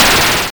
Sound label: Explosion